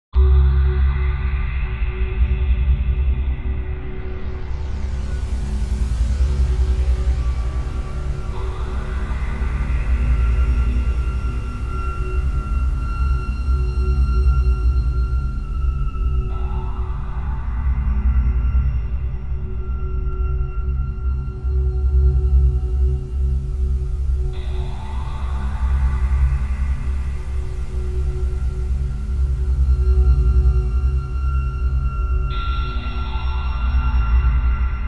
sound design horror drone atmosphere

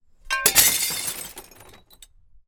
impact glass smash window or med large object

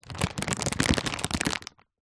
foley plastic packet thick crumple handling

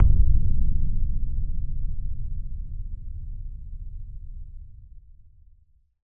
Impact Boom Rumble; bottlerocket

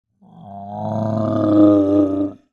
horror yeti growl or grunt

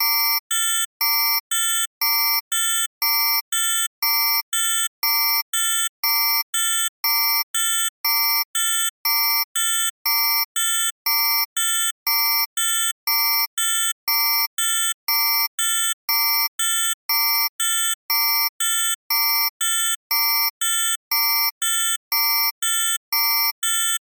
science fiction console alarm; sound effects one

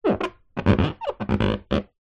sport surfboard body hand rub squeak

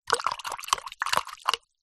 office paint brush artists wash in cup of water

